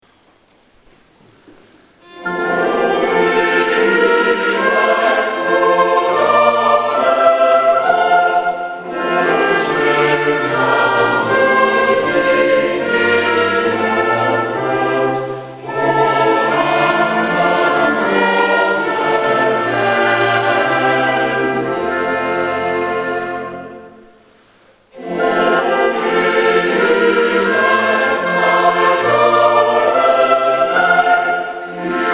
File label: Choral; BWV020 O Ewigkeit, du Donnerwort